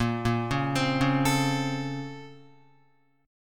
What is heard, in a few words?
Bb Minor Major 9th, Guitar; BbmM9; arpeggiated; 5,1,1,5,1,5; JGuitar